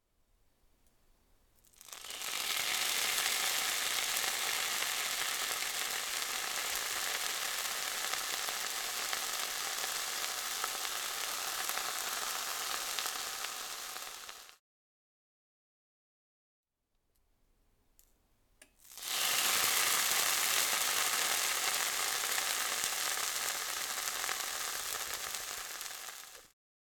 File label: Frying Meat